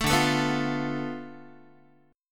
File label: D Minor 6th Guitar; Dm6 strummed; JGuitar